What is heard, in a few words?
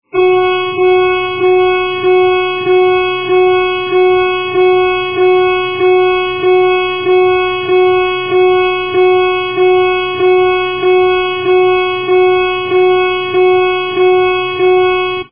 general alarm